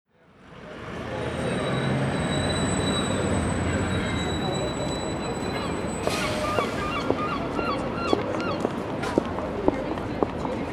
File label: Street Music